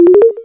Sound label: bonus